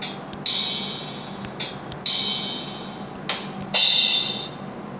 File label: Drum